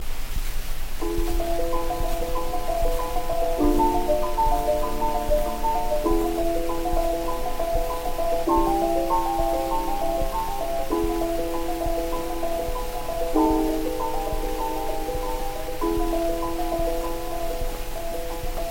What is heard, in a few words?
Gramophone